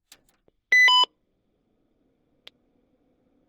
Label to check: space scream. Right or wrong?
Wrong